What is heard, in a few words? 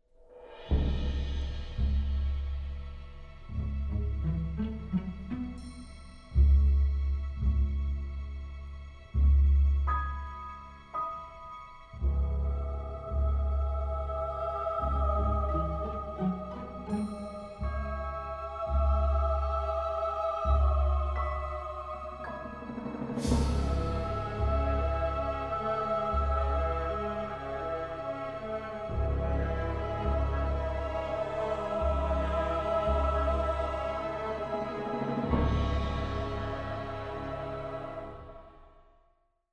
Music